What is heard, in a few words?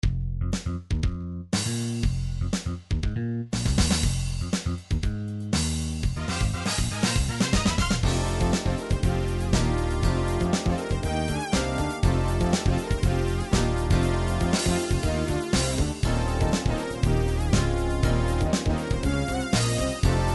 jazz pop